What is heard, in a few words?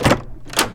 door opening; openintents; tigerand-safe